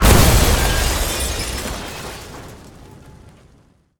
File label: Car Explode Squash